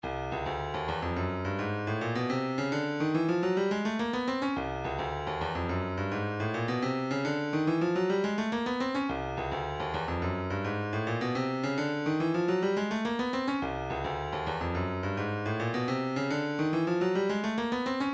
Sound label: talking drum; 106 Scuffle